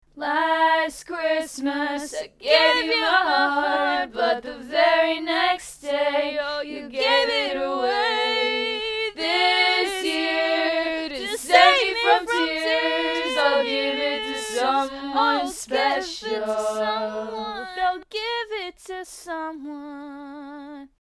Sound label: singing